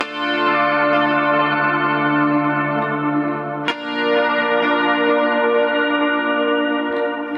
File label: G Gtr Chord Blend; Zero-G; Future Wave